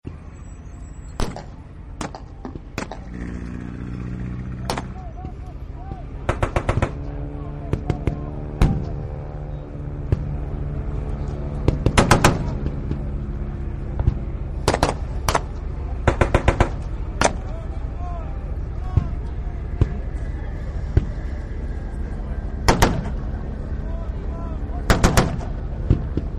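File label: WWI Battlefield Ambience, Field Fire; Battlefields; War; Wwl Battlefield Ambience; Partners In Rhyme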